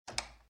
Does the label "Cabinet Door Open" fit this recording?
Yes